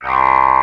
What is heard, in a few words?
Brush Ah; Mo' Phatt; Digital Sound Factory